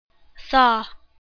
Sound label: saw